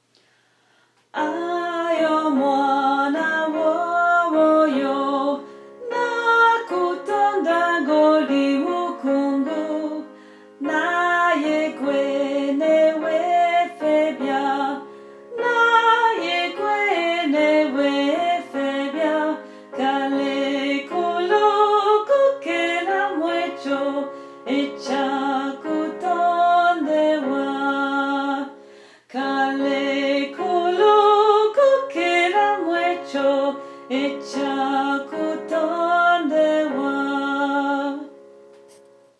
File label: soprano